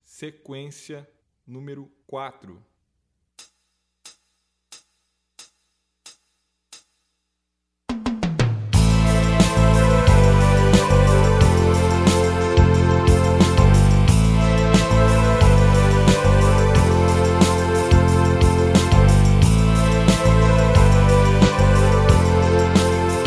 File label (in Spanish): La Eolio, guitarra